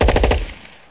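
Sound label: machine gun burst; ambience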